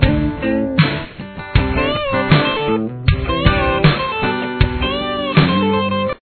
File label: run